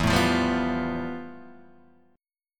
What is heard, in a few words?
F Major Sharp 11th E